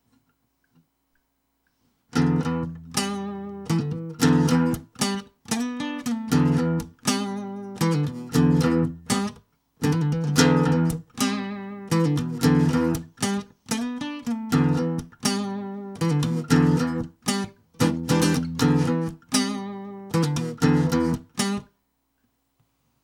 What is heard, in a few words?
Acoustic Groove in E